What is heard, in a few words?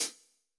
brush hat; Greytsounds; Scott Peers Sound Engineering